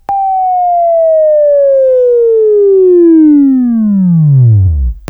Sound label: Buzz